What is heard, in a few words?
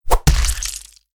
horror axe chop impact person fleshy wound guts; zapsplat